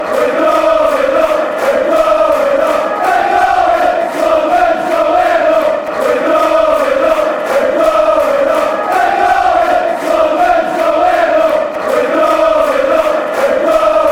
sunderland going, fan chants

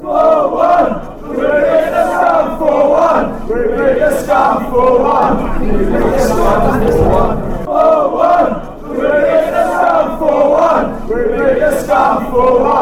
weve beat the scum, fan chants

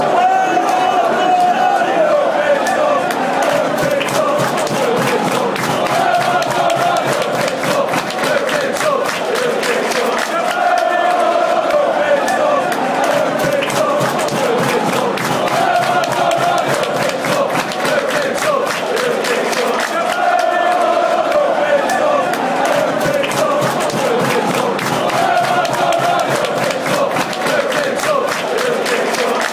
were all going european tour wigan, fan chants

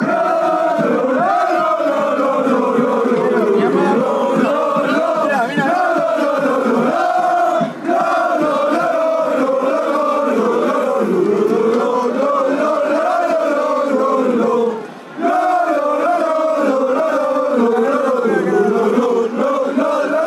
marcha real, fan chants

